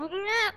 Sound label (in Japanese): ふんにゃぁ！; INFINITE UNDISCOVERY; ルゥ